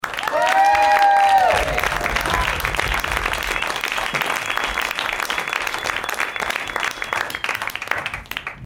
applause

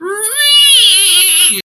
REEEEEeeee

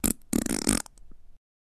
farting

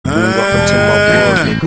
moo

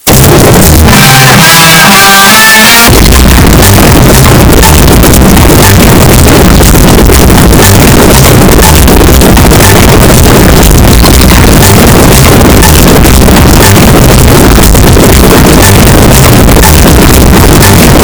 the loudest noise ever

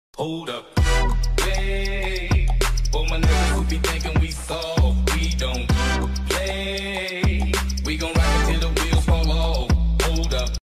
twitch hitbox follow donation sound

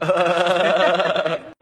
laugh

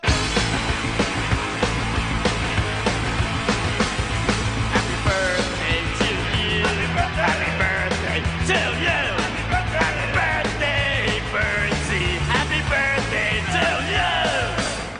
jet

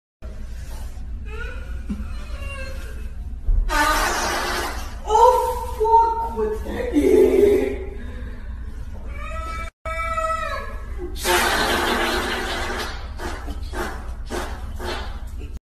lady farting restroom

